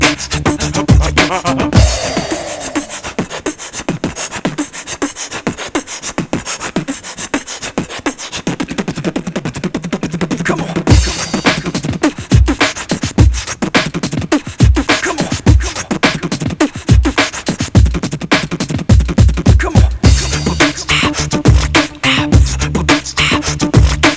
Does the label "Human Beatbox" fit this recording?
Yes